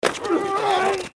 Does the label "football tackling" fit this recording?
Yes